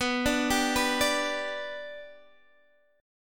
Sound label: B Minor Sharp 5th, Guitar, Open D